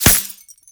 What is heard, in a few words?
Helmet Impact; Grn Chem Nox